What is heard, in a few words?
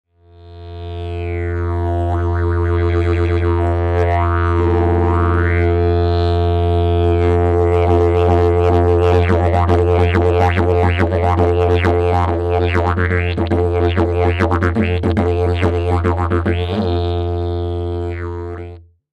didgeridoo